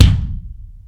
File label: large room bass drum sound c sharp key; kick